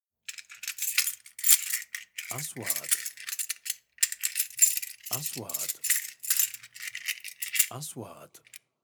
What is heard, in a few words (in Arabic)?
مفاتيح معدنية; sound effect